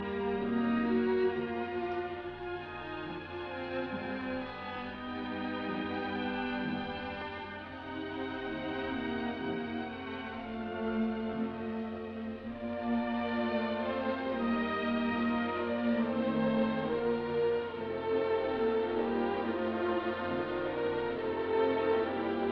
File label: Piano Concerto in B flat major Andante; Brahms; Op 83; Arturo Toscanini